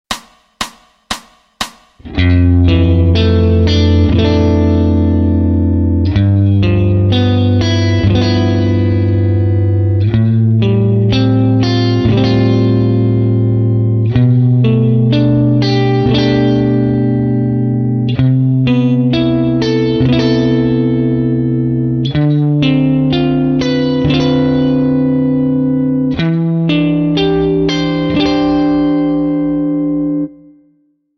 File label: G Mixo Harm In 4ths (6th Str); Harmonized From 6th String (5th and 1st strings omitted)